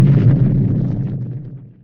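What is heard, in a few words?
earthquake Sound Effect; Soulforge Tower Defence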